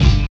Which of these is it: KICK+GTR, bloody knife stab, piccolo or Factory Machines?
KICK+GTR